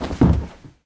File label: Bodyfall Carpet; SFX Kit; Bodyfalls